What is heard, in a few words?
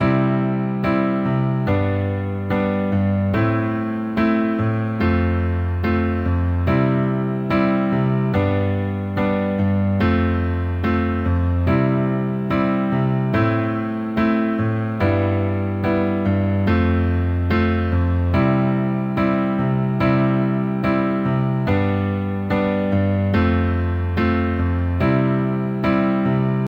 LH bass, accompaniment both hands